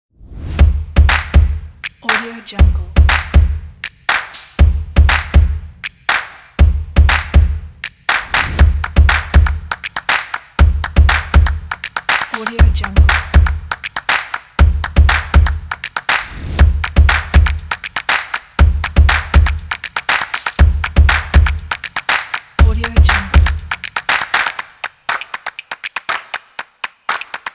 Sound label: drums